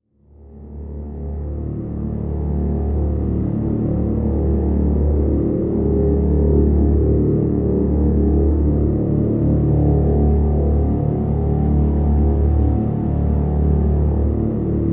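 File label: Drn Dormant D, drone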